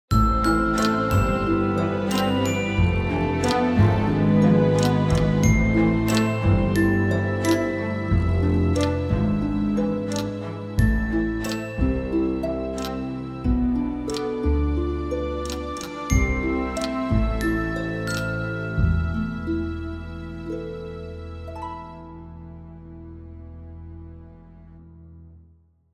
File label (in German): Dunkle Wolken